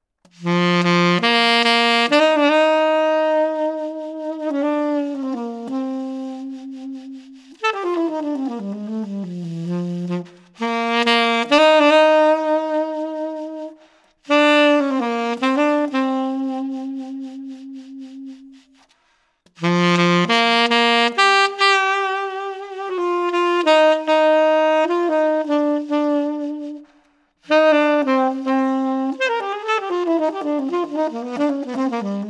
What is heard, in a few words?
Tenor Altissimo, tenor saxophone; Kessler C Tenor